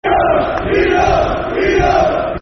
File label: Eagles, Football Chants (Soccer Songs); Crystal Palace Cpfc